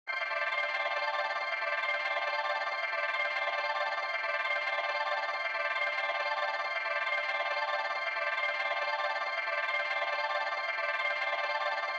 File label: ambient